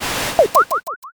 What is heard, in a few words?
gacha roll